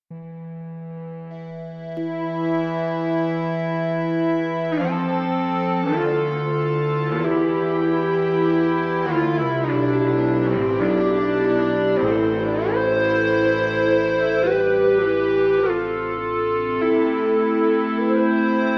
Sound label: Ebow Ensemble; Mille Regretz